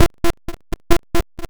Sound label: beat